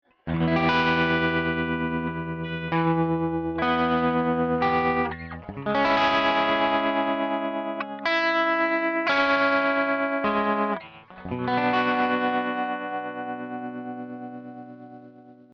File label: tremolo